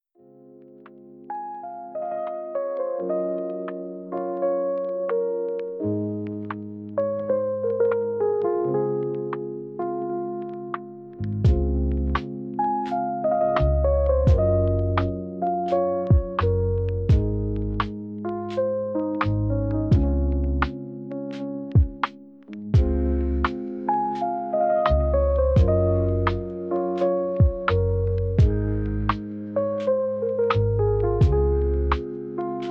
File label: Lofi Rain, Lofi Background Music